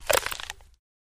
Corn Cob Bite

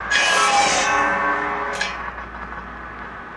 Sawmill Blade Comin

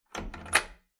stall door latch; latches & bolts

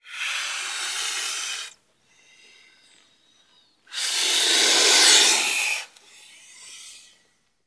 Boa, Albino Hisses, Multiple. Close Perspective.

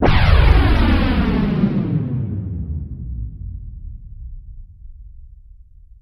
Rocket Doppler Whoos, space rocket

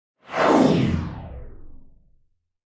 Descend Pulsating

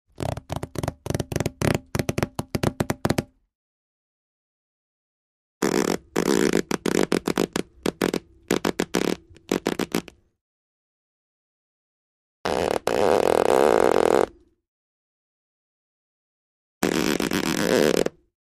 Skin Stretching Frict

